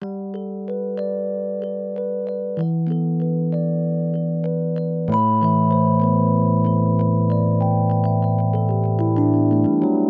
rhodes intro, Smooth RnB 095 Emin

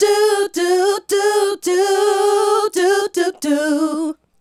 Female Group, Hip-Hop and RnB Vocals

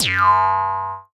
Jaw Harp; musicradar; cartoon caper

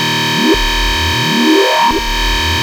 OSCAR D#1, Synthesizer; Hollywood Edge - Giorgio Moroder Rare Synthesizer Collection

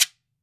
GUIRO; GM PERCUSSION KIT 3; AKAI S6000 CD-ROM - Volume 5

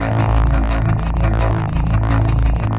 Seq Undertone, Drum N Bass, Keys Pads; Sonic Foundry (Sony Creative Software); Knutrix Drum & Bass

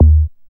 BD ANALOG; Sound & Vision - Gigapack II CD 2

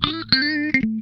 Funk Guitar; Zero G - Funk Guitar

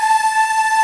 Ending loop

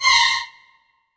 DD SYN, Disco House

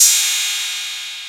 CRASH CYM 808; E-MU Formula 4000 Series Vol. 3; Analog Odyssey; VK+ Instruments

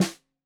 Snare 65 Reg Stereo, Deeply Sampled Drums

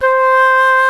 SOLO WINDS

